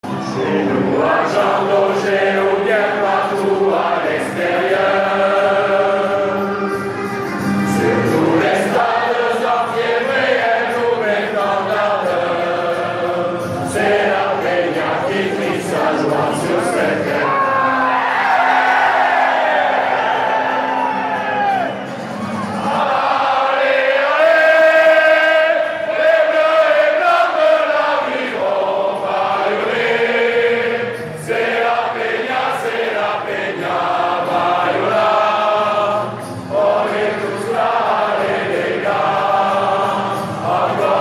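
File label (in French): Hymne bayonne, sonnerie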